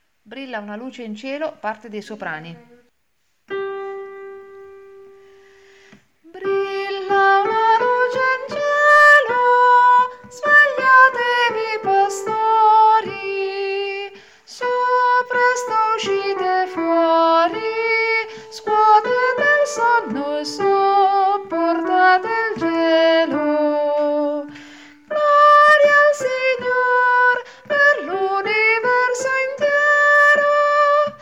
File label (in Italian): brilla una luce in cielo soprani